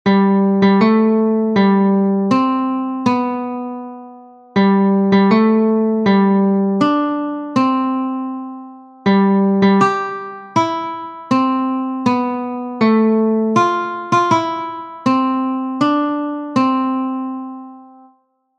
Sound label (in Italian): chitarra tanti auguri Chitarra